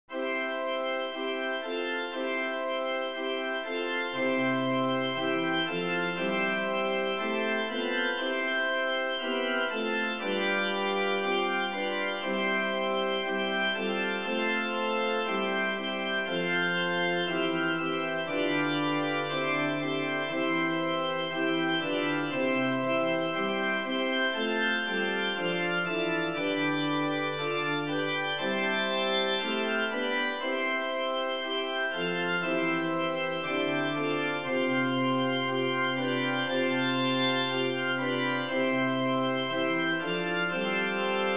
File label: Organ with bell solo